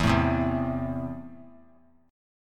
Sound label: E Suspended 4th Sharp 5th; strummed; JGuitar